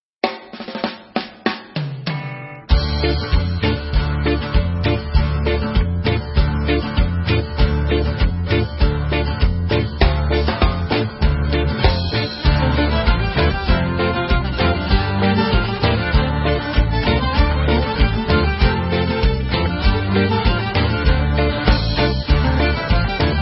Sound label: Instrumental; Kritiko Serviko